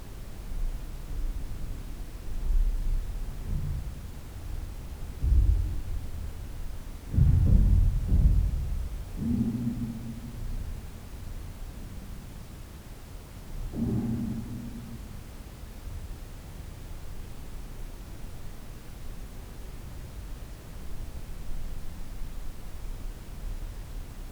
possibili passi e vocalizzii n Sala Pietra

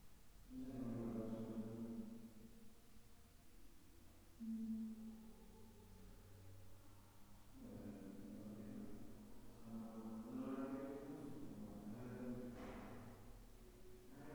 probabile fonema con canto in sottofondo sala della musica

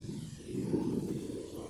possibile respiro vicino microfono

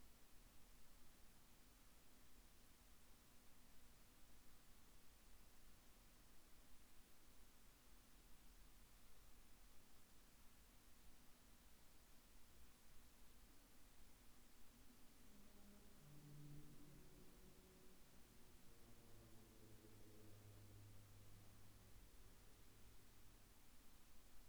possibile canto sconosciuto sviluppato nella sala della musica

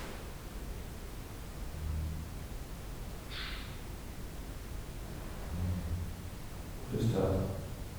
possibile lamento in Sala Pietra

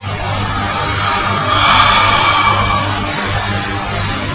respiro isolato nel salone mongolfiere

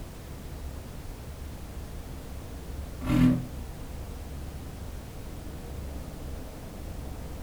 probabile spostamento oggetto in legno nella torre completamente chiusa sotto allarme

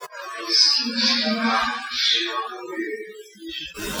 possibile Vocalizzo femminile non umano freq. lavoro dai 2 ai 6000hz precedente alla voce

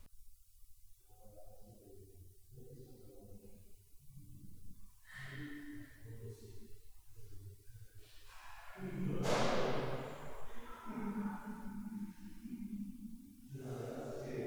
Elab. Risata

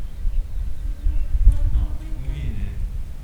canto isolato

